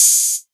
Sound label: 808 Hat O